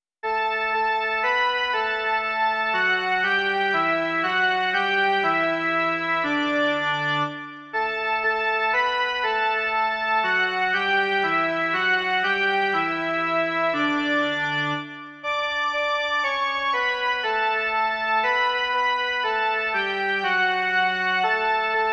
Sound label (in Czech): jen melodie; Aby nás Pán Bůh miloval